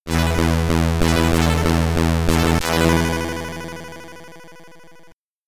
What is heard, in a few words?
SID Stereo; Kendo Warrior; Commodore 64